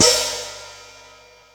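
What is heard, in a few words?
splash, Hi-Hat, Yamaha DTXpress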